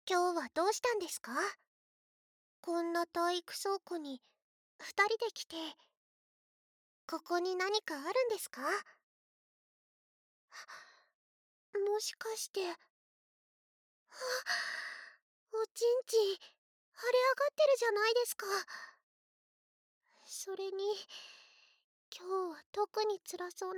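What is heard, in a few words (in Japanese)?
フェラ＆ドスケベ耳舐め; まほの性知識ゼロフェラ＆かなのドスケベ耳舐め♪; 効果音カット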